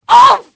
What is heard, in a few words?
woman hit, duel; Pirates Of The Caribbean